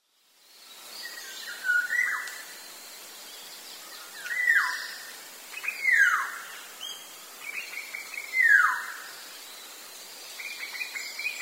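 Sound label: black-naped oriole, sakata; koe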